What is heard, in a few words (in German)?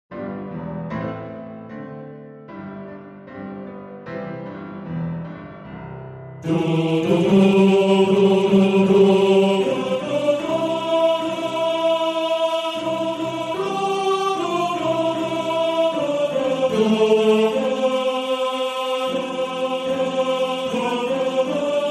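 Tenor 1, Männerchor; Einsames Glöcklein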